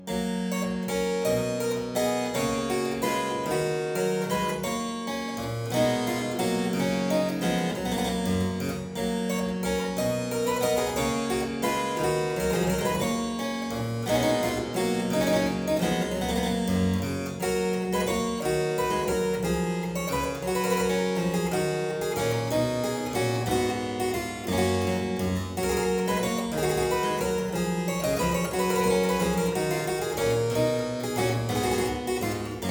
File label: Aria in G major Partita 23, Harpsichord